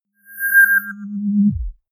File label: screen shut down double swell; energie